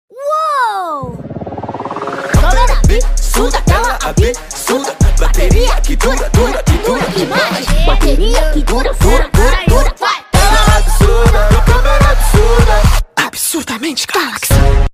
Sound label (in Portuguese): CANTO PERSONAGEM